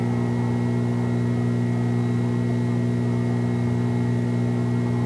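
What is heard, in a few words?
electric ambient; ambience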